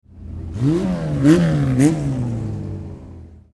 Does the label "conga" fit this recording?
No